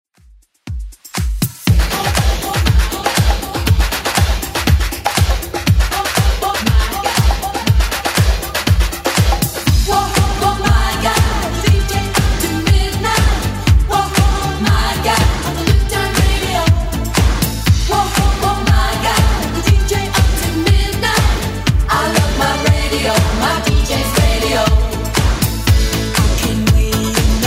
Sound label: 80s Club 120 bpm CLEAN